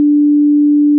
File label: D short mono